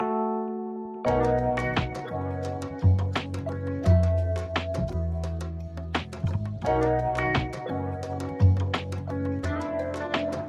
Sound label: Strings Things, Chill Out y Lounge